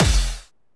SE GAME GOOD; maimai

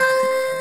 Chord C6; 音MAD; 学园孤岛

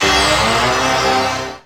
SE GAME BREAK; maimai

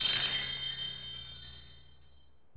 SE GAME SLIDE; maimai

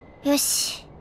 hat; 音MAD; 少女终末旅行; 소녀 종말 여행 음원팩; Syaro Sample

